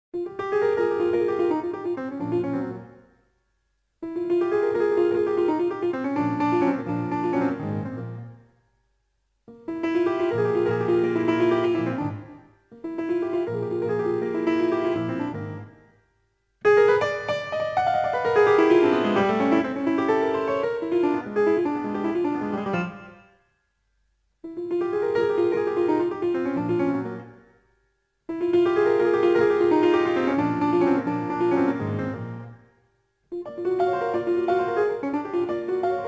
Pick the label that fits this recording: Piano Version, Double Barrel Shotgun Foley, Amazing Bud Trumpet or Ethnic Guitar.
Piano Version